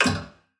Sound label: robotic click; soundscrate